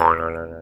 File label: flip